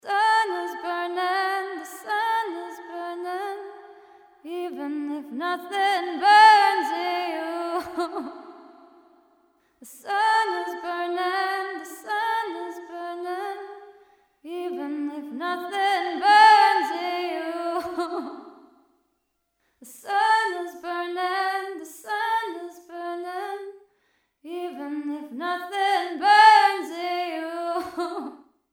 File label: Synergy R1 long reverb plate room; Audified